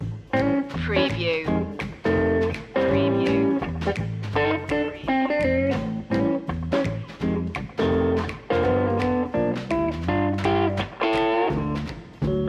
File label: fixin to score loop; screwball comedy